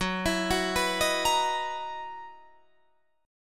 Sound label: F sharp 13th F sharp Guitar Drop D